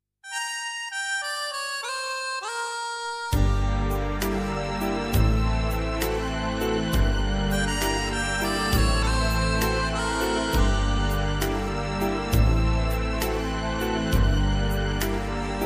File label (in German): Chanson